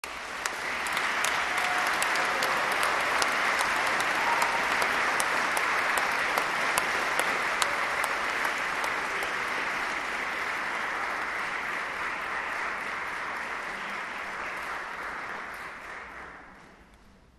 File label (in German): Applaus fuer die Kammermusik; Konzertmitschnitt vom 20. Dezember 2016